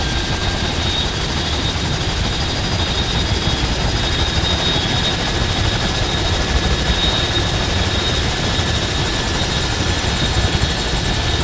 main rotor damage layer; War Thunder

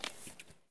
soda can pickup; Escape from Tarkov

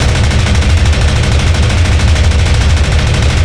P-51D Cockpit Browning; DCS

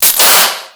jumpercable sparks; favela; Call of Duty Modern Warfare

